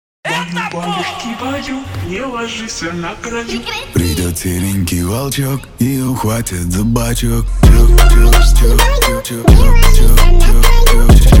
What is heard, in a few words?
brazilian phonk